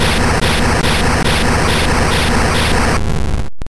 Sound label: Earthquake; Pokemon; Gen 2; attack moves; GSC